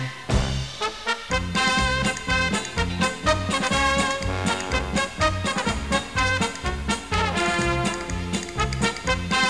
Paso Doble

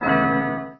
alert

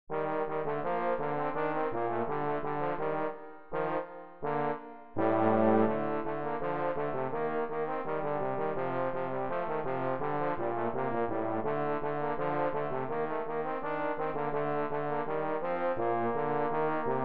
duet; brass

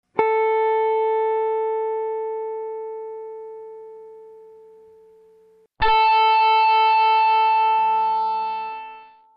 guitar note plus lp sqr

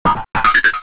enter channel

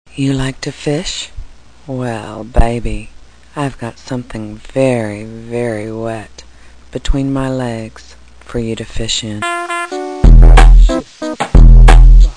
hip hop

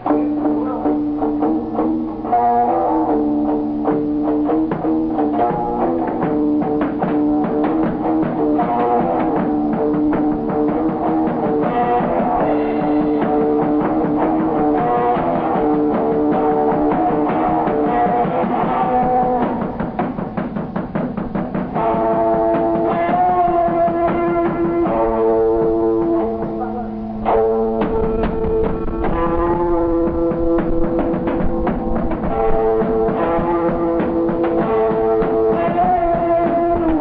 riff

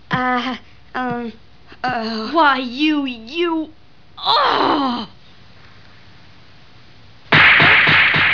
Uhhum; Ranma

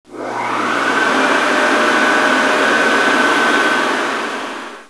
hair dry, banheiro; casa

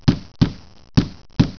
hammer

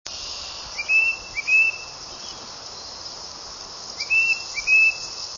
titmouse, tufted titmouse; birdsongs

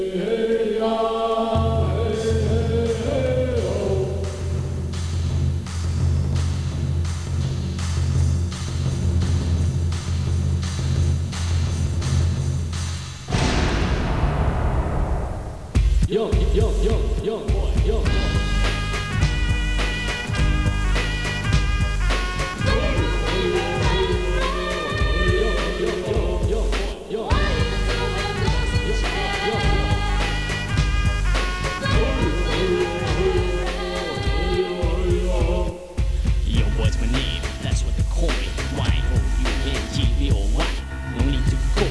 War Chant

